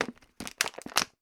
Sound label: foley bottle plastic movement; sound effects one